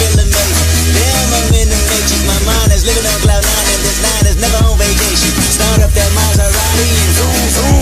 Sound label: hip hop rap